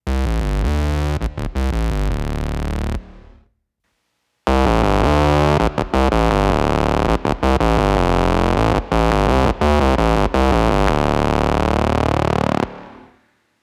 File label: synth, saturated square